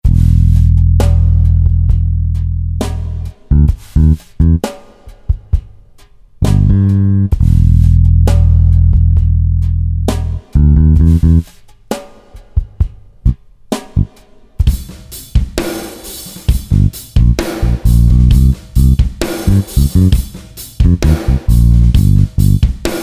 Drum LP,Roland SRX-07 Ultimate Keys

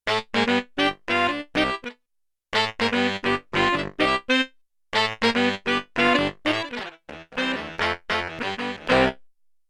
BS&TS Unison Band, Big Band; Yamaha TX16W; Big Band Performance

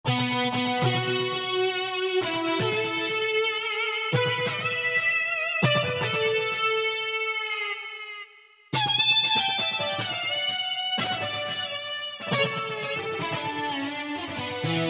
Lead Dist; Generalmusic Equinox

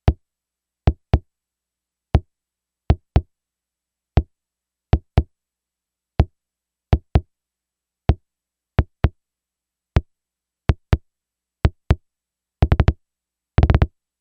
Bass Drum, Yamaha DX11; Factory Preset Voices

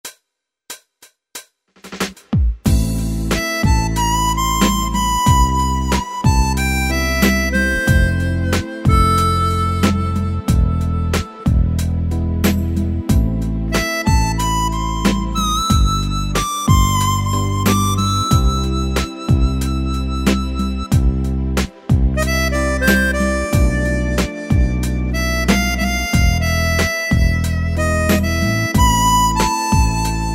8 Beat Modern, Pop & Rock

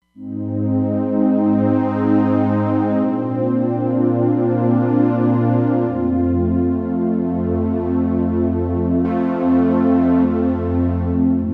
R&B Soft Pad, Roland Fantom XR

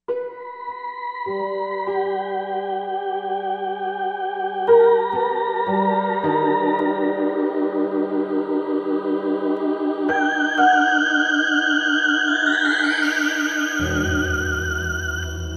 Ghost Piano

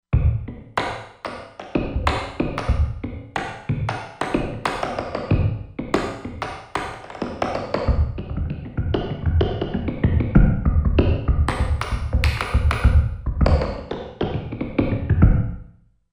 Horse Hit, Korg MSC-16S; Korg MSC-16S Environment

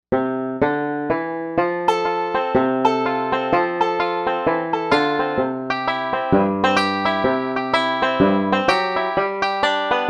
Banjo, Korg M1Rex; Factory Patches

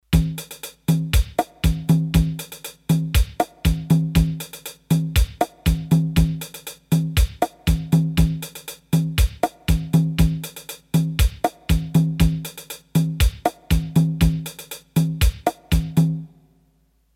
Rhumba, Roland D-10, Rhythm; Rhythm Demos

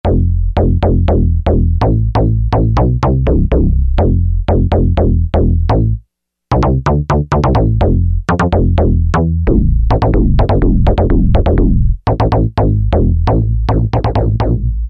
Alesis QS8, Deutsch Bas; Factory Preset examples

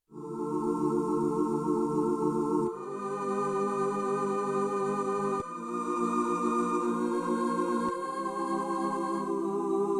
Slow Attack Ooo, Pops Voice, Yamaha TX16W

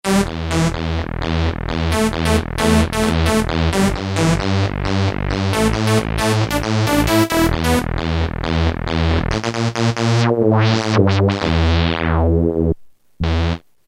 Media Synth; Roland M-DC1

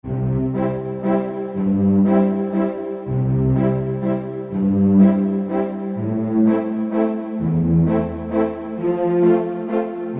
Symphonique

